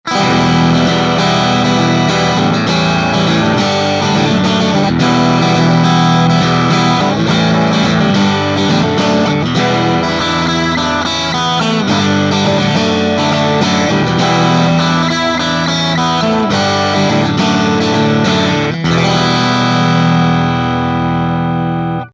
Crunch Mid Bridge

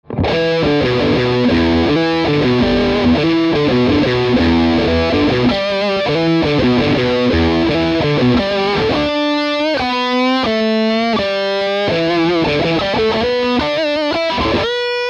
Strat Am Pro Metal Puente medio

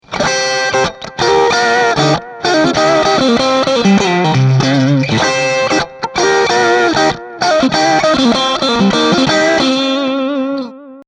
Licks Neck Mid

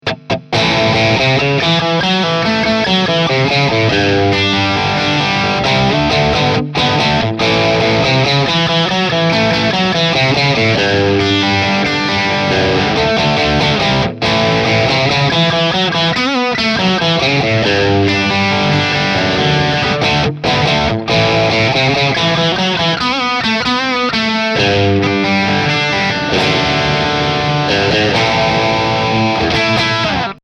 Dist True Bypass

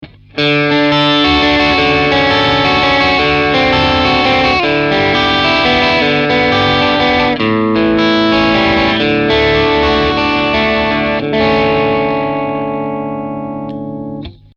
Strat Am Pro Overdrive medio

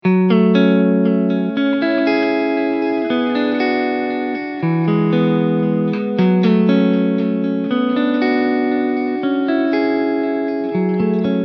hx harmony delay

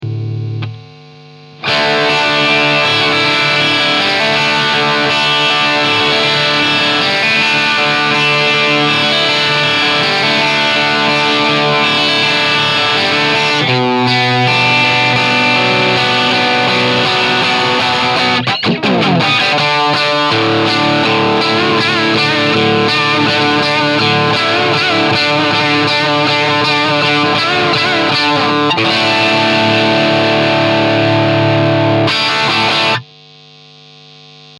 Brit Plexi Brt

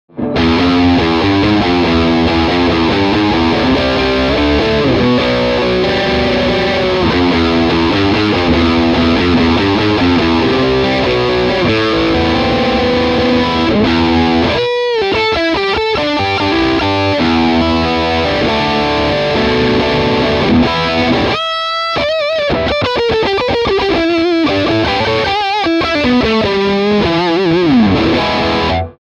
Strat Am Pro Metal medio

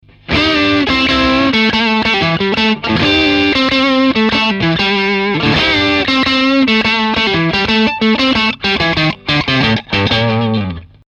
Strat Am Pro Dist mastil

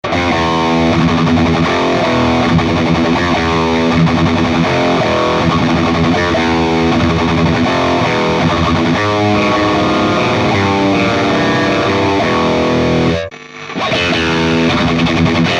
Solar guitar DIST PICKUPS

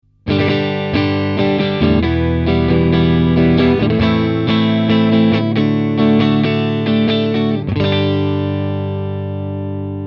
Strat Am Pro strum mastil

